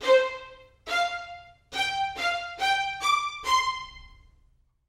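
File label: violin staccatissimo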